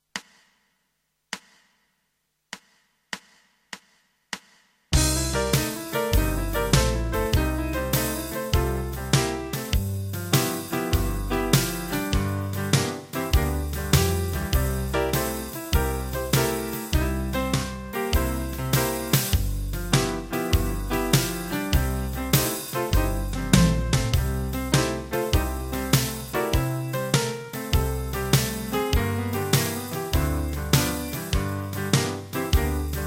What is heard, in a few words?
Guitar backing